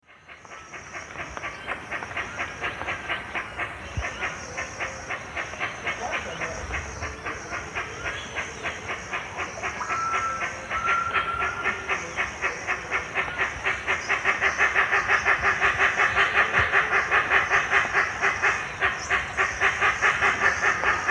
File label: Penelope jacquacu, calls; S. America; Eastern Lowlands